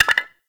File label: Clay Pot Hit Drop; Gameplay; Awakening Remaster